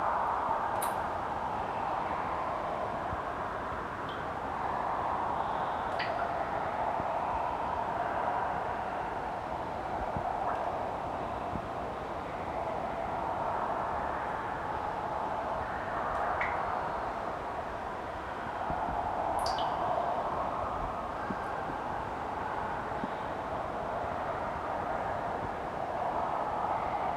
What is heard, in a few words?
Reverberated water droplets; SFX; IRL Recorded